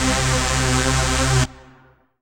RAVE, RAVESYN

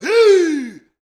BRAZIL, World Voices

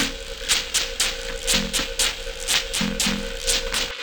Percussion Loops; Drums

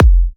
F, kicks; markus hakala kicks

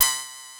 ATTACK LD C3, Analog Fantasies; USB Soundscan vol.49 - Analog Fantasies; AKAI

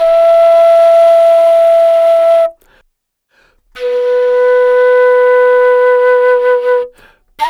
Wood Flute, Asia; Sonic Foundry (Sony Creative Software); Continental Drift Aftershocks

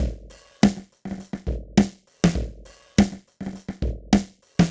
Ring Loop, Drums & Percussion, Electronic; Sonic Foundry (Sony Creative Software) - Electronic Point Blank

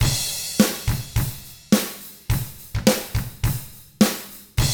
105 POP RK, Drums; ILIO; Double Platinum Drums 1

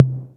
Misc Tommy, Drum Machines EMU MoPhatt

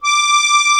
HOHNER, akkordeon

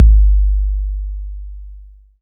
Kick long, Electric Kit; Akai MPC2500

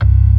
ORG.3 C1; B3 FULL

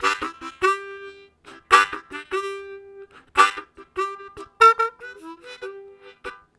Quiet, Harmonica, Riffs; Zero G

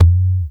WD, DRM HITS; Spectrasonics; Liquid Grooves; WAVDRM HITS1

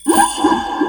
Whale Ambi; Jungle; FX; Jungle Warfare 1&2

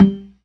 PERC, ORGAN; Keith Emerson Signature Series

